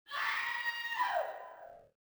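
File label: scream, female; colombia; ambience; Soldier Of Fortune II Double Helix; XBOX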